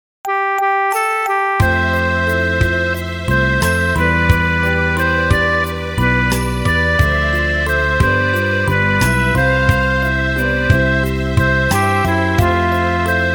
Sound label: Sad Version (Sad)